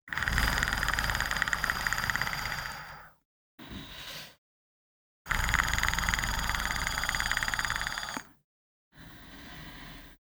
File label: Cloth Sleep